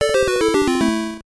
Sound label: Life Lost; Muzyka i dźwięki z gry